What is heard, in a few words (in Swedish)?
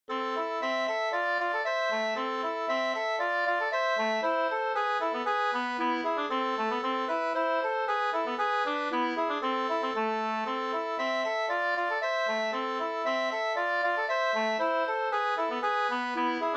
langdans ringdans